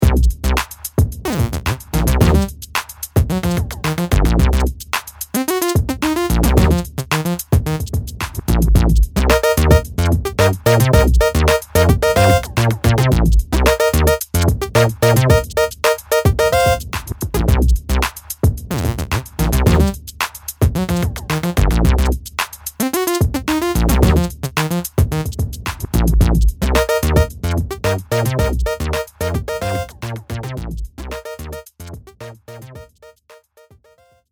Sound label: Kontakt Electro